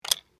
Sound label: click